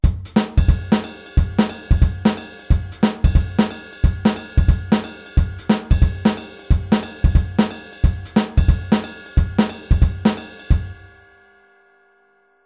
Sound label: Groove off; Shuffle Offbeat